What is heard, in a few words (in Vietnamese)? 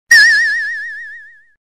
am thanh choang vang chong mat ngan hon